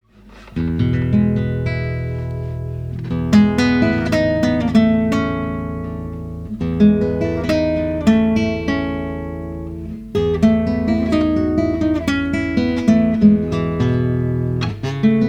Tannoy MR425 Stereo Nylon Guitar Processed, bi-directional ribbon microphone